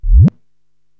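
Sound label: BD Tekno Rev, bass drum, drum machine; Korg TR-Rack; TeknoKing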